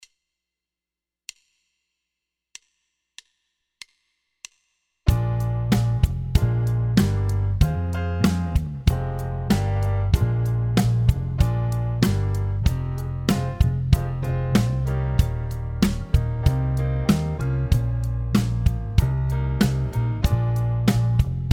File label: blues